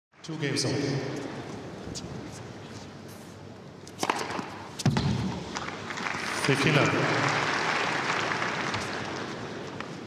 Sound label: tennis match ambience; effect